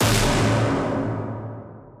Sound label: big hit; machine tribes